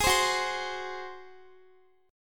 F sharp Suspended 2nd Flat 5th, Guitar; F#sus2b5 strummed; x,x,20,17,18,20; 4F,4A,5C,5G,5C,6E; chord shape

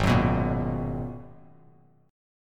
Ab Minor 9th down Bass Standard; strummed; JGuitar

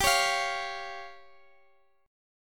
C sharp Major Flat 5th G

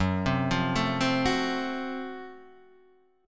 F sharp Minor Major 7th Flat 5th, guitar; F#mM7b5; {2 3 3 2 1 2} arpeggiated

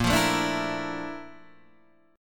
Bb Major Sharp 11th Bb down Guitar DADGAD, chord

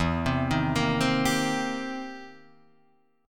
C Minor 7th E; Cm7/E arpeggiated

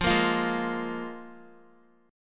F Minor Double Flat 5th, Guitar; Fmbb5 strummed; JGuitar

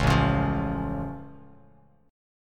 A Augmented A down Bass Standard; strummed; JGuitar

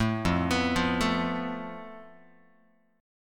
F Major Flat 5th Guitar, chord; FMb5 arpeggiated; JGuitar

